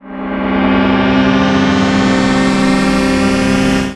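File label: synth, Production Effects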